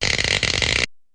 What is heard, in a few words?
spark; physioex9